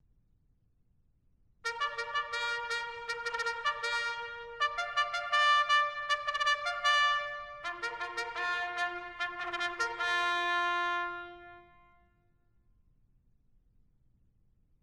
Bugle Calls Mess Call

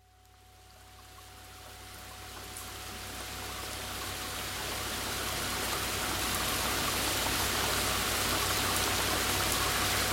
Rain Calm Binaural Beats

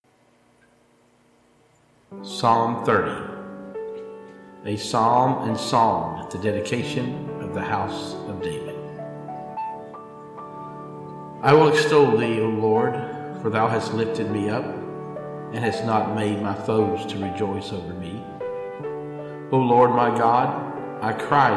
Psalm 30 with Piano

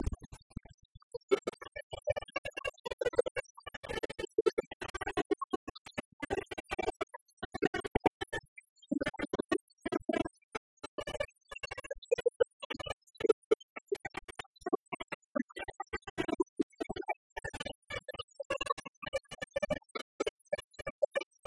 Planxty Irwin Violin group

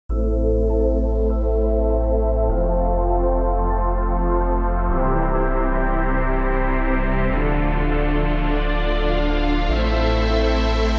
Long Way Instrumental

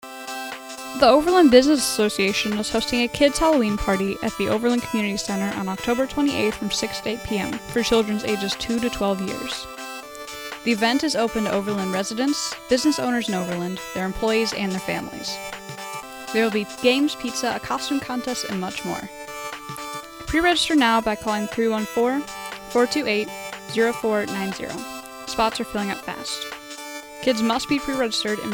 kids halloween party jingle

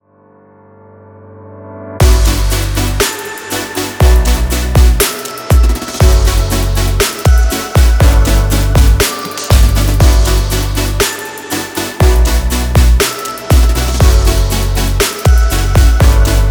Future Bass Short Version

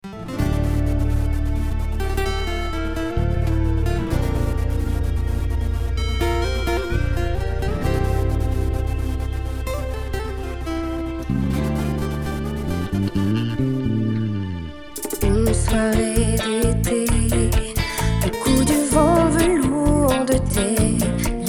French Bachata Remix; Mashup

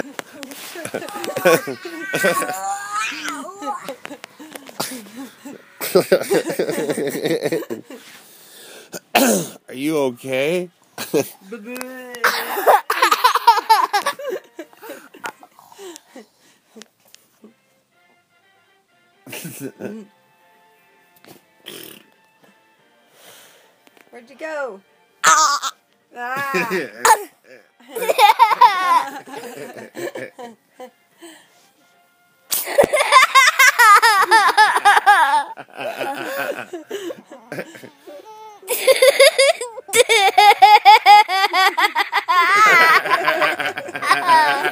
laugh

